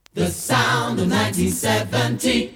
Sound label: jinglepackage; topformat LP jinglepackage 2